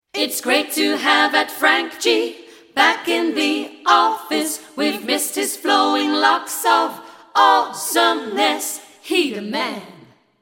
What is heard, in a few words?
singing tweetagrams